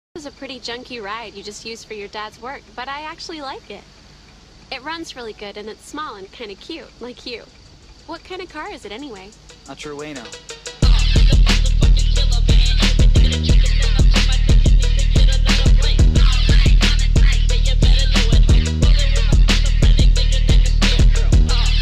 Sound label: DRIFT PHONK